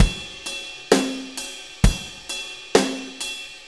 4-4 Loop, Drum Loops, Full Kit Loops; Drum Tools

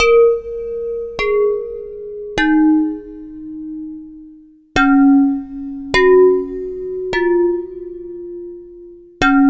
Slow Clay Dish

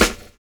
FAT DISCO; Univers Sons

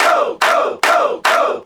Crowds; Vengeance - Essential Clubsounds Vol.2

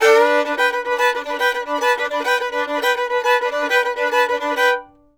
FIDDLE; Sampleheads; Whole Lotta Country 1 & 2

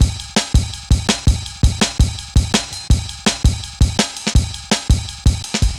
Jazz Ride, Drum Loops, 165.5; Zero-G Jungle Warfare 1, 2 & 3; Jazz Ride B; 90 s Sample CDs

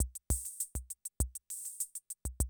CR78 100, DrumLoops, 100 BPM; Best Service; XXL 1500 DrumLoops

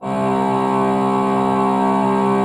C2, Cathedral Organ, HOLZPRINZIP; Propeller Island

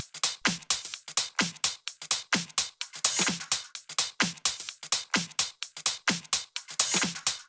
Loops 128BPM, Drumloops

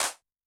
Clap A, Yamaha DTXpress-II snare

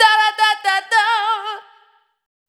Output, Single Voice Melodic Loops

